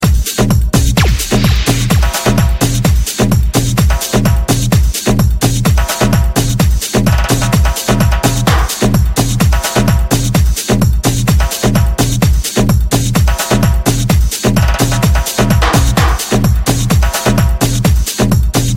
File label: Electronic Tribal